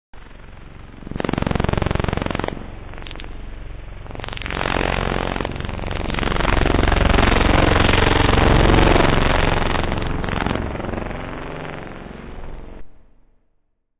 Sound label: Signal